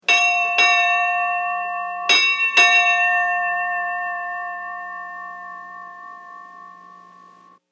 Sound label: Ship Bell 4 Rings; Bells (Sound Effects:Musical)